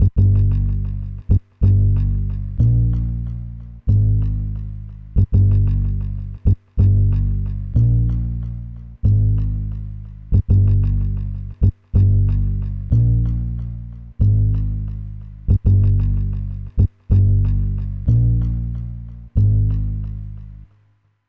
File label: Sneaker Bass; Zero-G Smoke